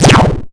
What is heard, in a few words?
teleport; Gravity; planet